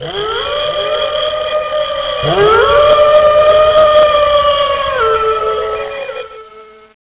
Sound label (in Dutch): serenade, Dieren